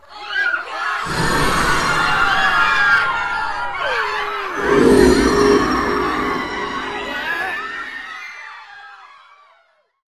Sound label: crane break escape; American Fugitive; SFX event